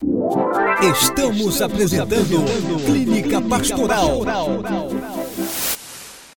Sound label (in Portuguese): vinheta